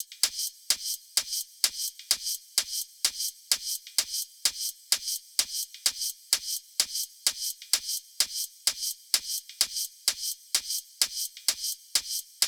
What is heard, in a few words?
Techno Hihats